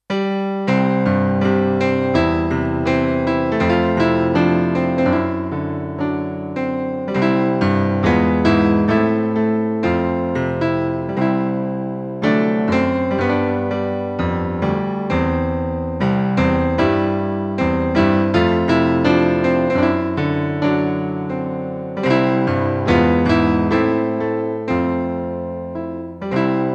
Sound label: piano